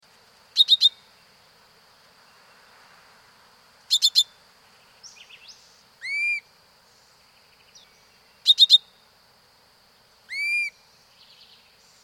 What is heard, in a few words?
Yellow-Throated Euphonia, S. America